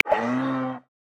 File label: Moose, Polar Region; Xiaomi Redmi-8A